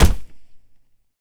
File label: Push Hit; zps; Weapons; Melee